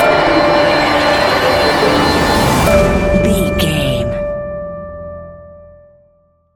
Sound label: modern horror stinger; Video Game